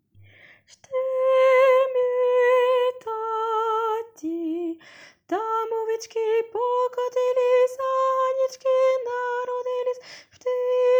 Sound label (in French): Tenor (mi mineur); schedryck